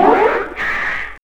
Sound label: TECKNO; AMG - Vince Clarke Lucky Bastard